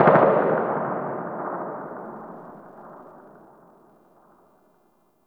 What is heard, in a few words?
Distant Gunfire; PVP